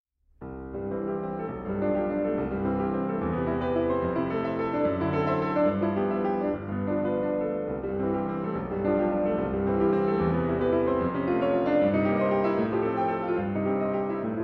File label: Prelude in C major; Chopin; no. 1